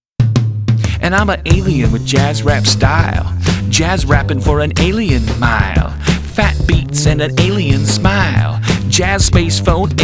Alien Jazz Rap; Rick and Morty

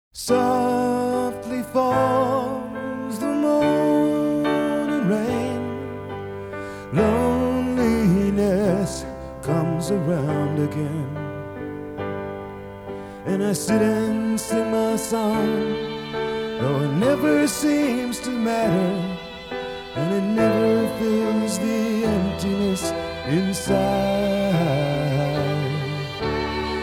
(Previously Unissued Strings Version)

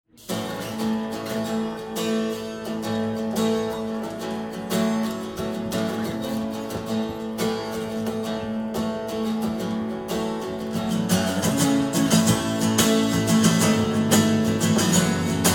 Acoustic Version

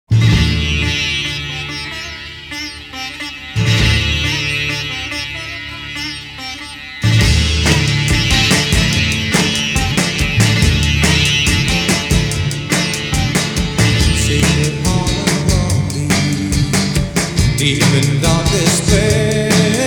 (Sitar Version)

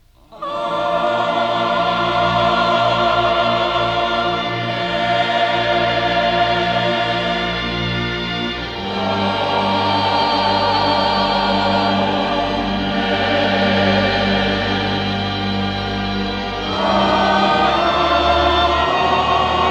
Ascension, Amen Chorus

